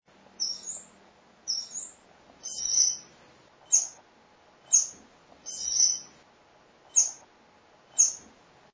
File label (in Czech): zaba samec